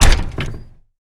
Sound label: Window Open